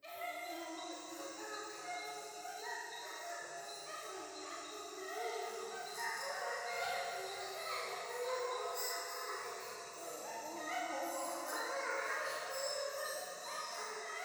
教会 喘ぎ 山奥 夜

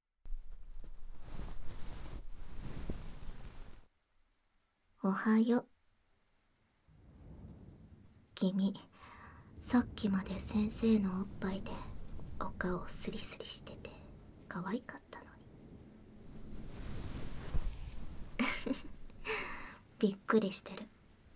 雨音とぐっすり耳かき&ささやき【BGMなし】, ASMR

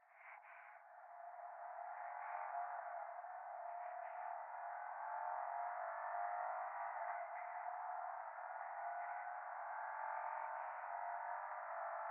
左耳密着吐息 車の中

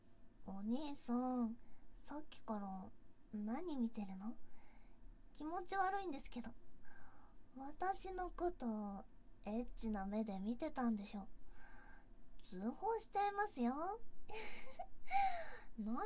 オホ声; 近所の生意気な娘が調子に乗るのでちんぽで分からせ調教孕ませセックス〜処女だったのに中出しされてお兄さんのママになっちゃう〜

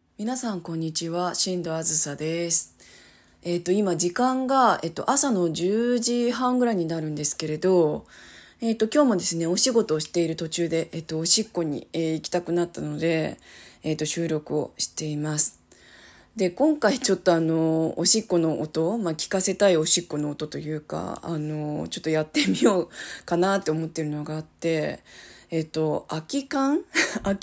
ペットシーツの上でビールの空き缶におしっこ

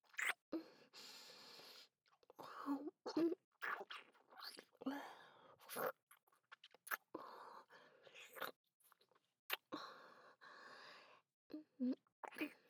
キス; アドリブ集

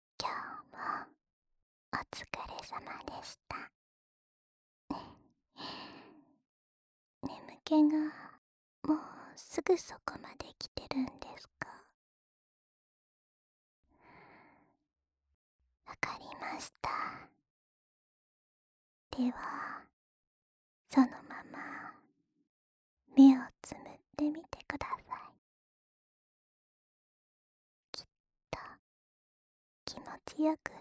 吐息いっぱいの添い寝（右側), ASMR

